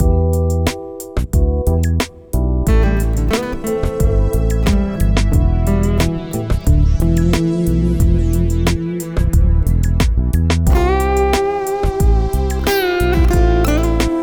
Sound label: loop